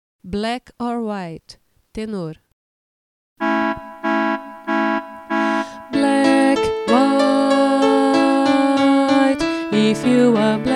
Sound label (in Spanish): naipe tenor; 4 VOZES